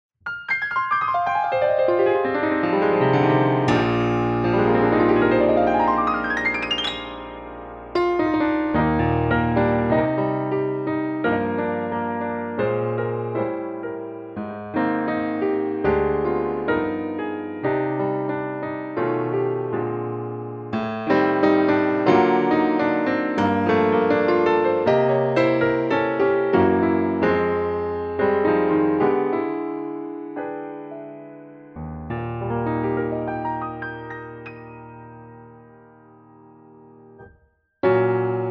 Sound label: Black Grand MkII Medium Perspective